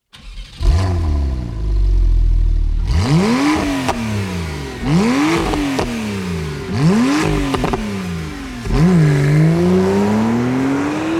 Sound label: porsche 911 Carrera Sport Exhaust System